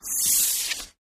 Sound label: paper rustling; paper mvmt